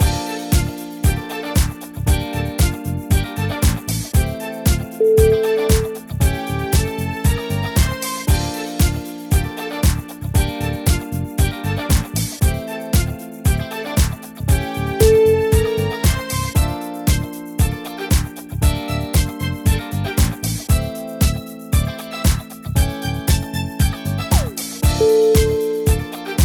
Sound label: 70s disco beat